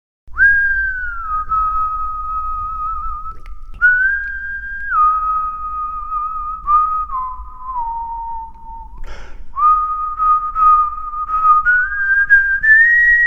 Whistle Swing Low

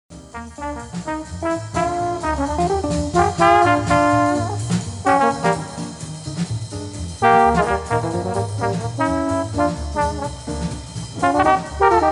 Duet Part Added; TROMBONE DUETS/TRANSCRIPTIONS

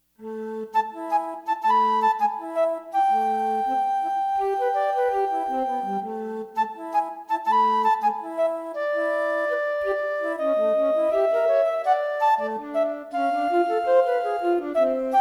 BOSSA; Duet

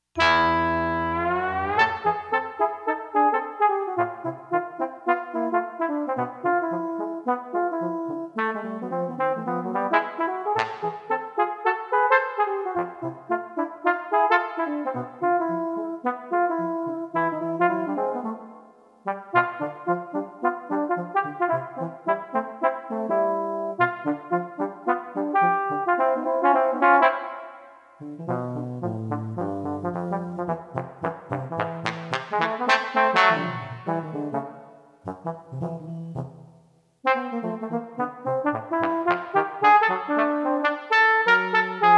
Two Trombones

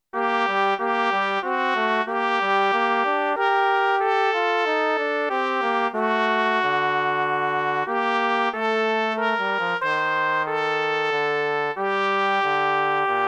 Tpt Bone; Coventry Carol; Trumpet

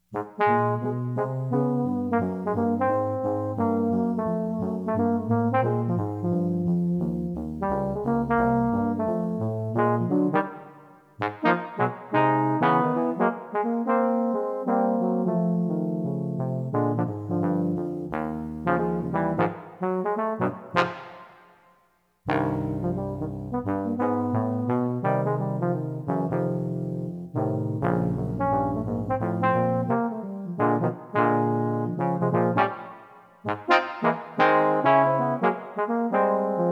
4 TBN NO LEAD; TROMBONE QUARTET

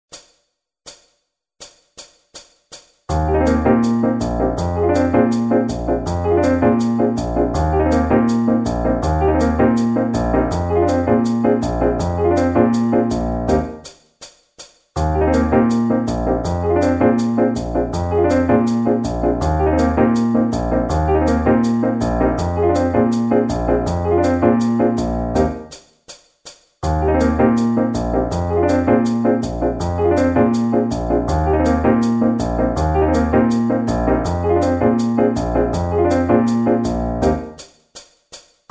Jazz Fragments Audio E flat

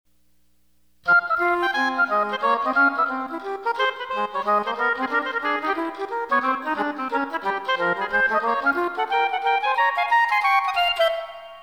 BOP; OBOE/ENGLISH HORN; Second part is english horn.; Duet

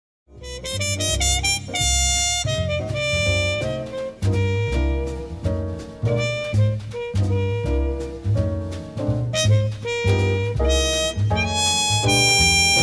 Duet Part Added; TRUMPET

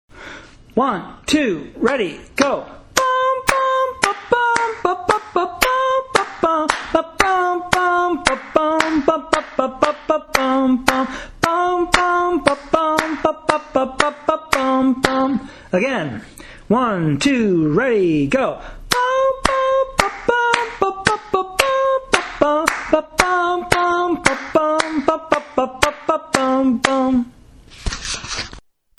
Russian Sailor Dance Rhythm Exercise

